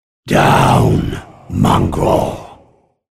dead, grunt sounds

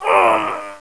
die, player; bts rc; construction

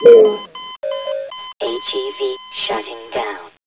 death, player helmet; bts rc

